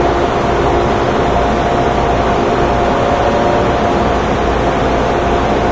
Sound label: ambience; svencoop